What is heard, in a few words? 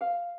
Harp